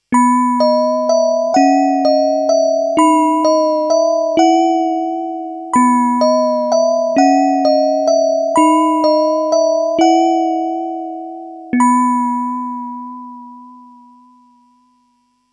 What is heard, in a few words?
Casio CZ101 glockenspiel; Casio CZ-101 is a digital synthesizer using phase distortion synthesis